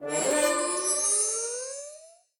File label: multi Attention; robin hood